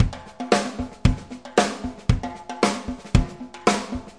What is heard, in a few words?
rolling kit with frame drum, 114 bpm